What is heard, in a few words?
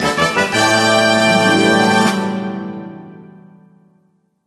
Jingle Lucky; Ape Quest; PSP